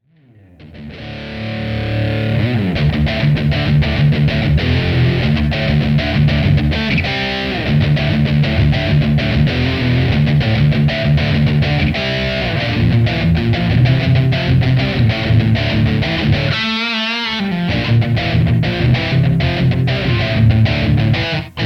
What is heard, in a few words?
NuMetal Low Gain